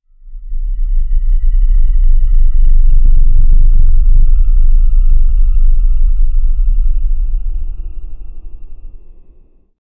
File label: a colossal cosmic horror, cosmic